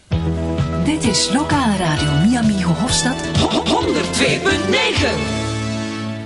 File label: jingle; Mi Amigo Hofstad